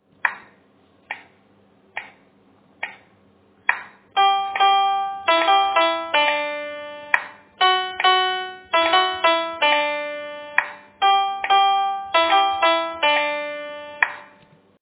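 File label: voice chorus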